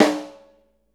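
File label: snare drum, JAZZDRUM, left; Ueberschall; Drum & Bass Flash Trackz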